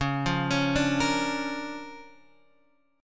D Minor Major 7th Sharp 5th, Db, guitar

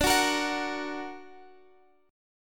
F sharp Augmented D

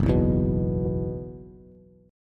F sharp 7th Suspended 4th Bass, chord; F#7sus4 strummed

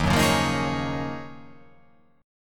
D sharp Minor 11th D sharp, chord

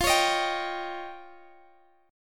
F 7th Suspended 2nd Sharp 5th F

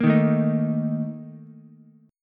A sharp Major 7th Suspended 4th, Ukulele; strummed

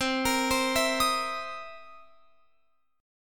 C 7th Sharp 9th C Guitar Open F; C7#9 arpeggiated; x,19,22,19,19,22

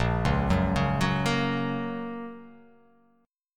A sharp Minor Sharp 5th, down

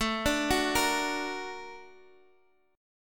A sharp Augmented Major 7th A, guitar

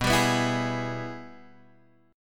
Ab Minor Major 7th Sharp 5th

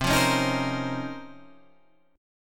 C sharp Major 13th, chord

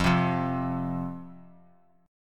F 5th F, down, chord; F5 strummed

